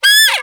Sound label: sax; East West; Global Sounds